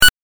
8bit 選択

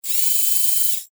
ロボットアーム

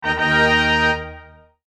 ジャジャーン

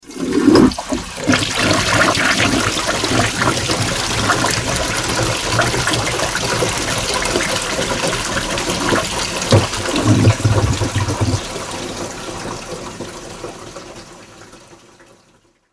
水洗トイレ流す

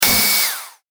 小型ロボットの駆動音

